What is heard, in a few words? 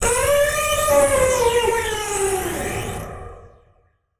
Title Transition Digital; Adobe; Production Element